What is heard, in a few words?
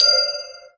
Alert; Tanks & Mitigation Buff